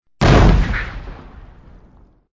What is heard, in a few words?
Explosion Cannon Fire